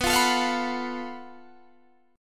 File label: Gb Minor Major 7th Double Flat 5th B